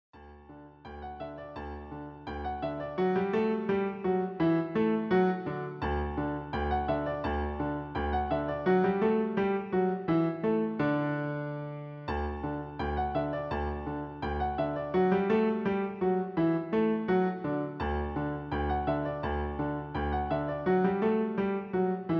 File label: Musette; BWV Anh. II 126; Clavierbuchlein vor Anna Magdalena Bach